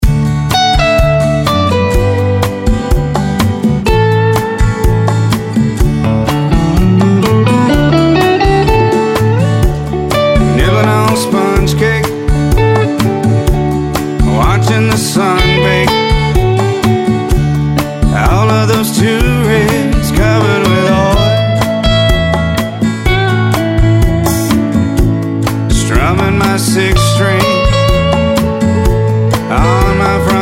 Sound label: SOLO ACC GUIT